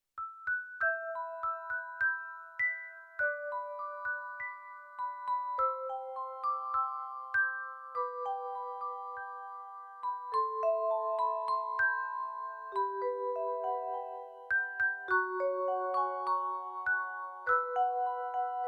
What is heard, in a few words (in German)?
orgel; laputa